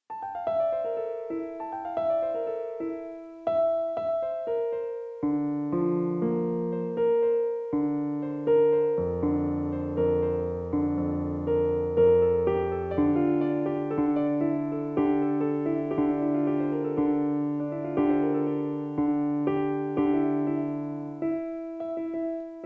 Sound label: Piano; Virtual Music Composer 4